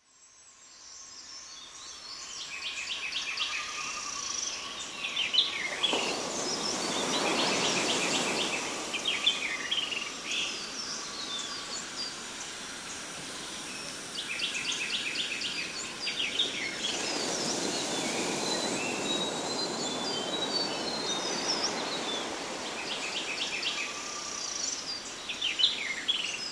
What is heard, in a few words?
Acapella